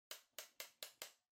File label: Electric Fan Far Height